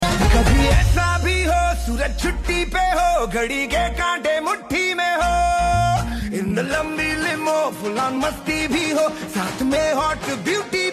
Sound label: Ringtone